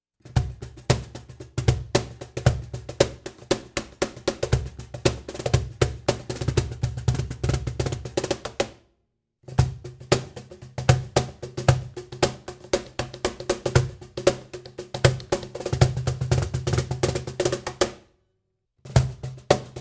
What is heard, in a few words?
PCU Tycoon Vertex cajon